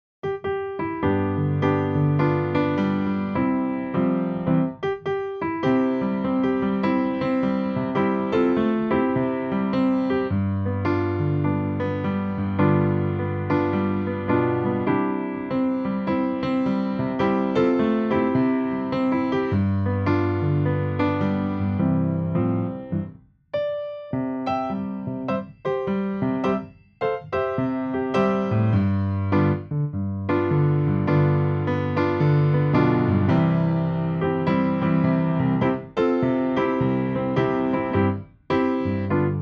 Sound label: hes got the whole world in his hands backing